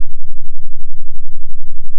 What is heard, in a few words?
fm voice single; manhattan; FFTimbre